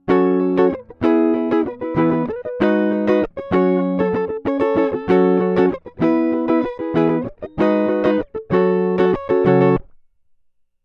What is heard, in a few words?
Pattern with electronics